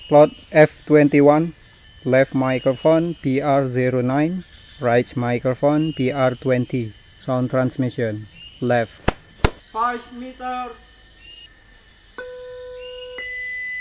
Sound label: birds; ecoSound-web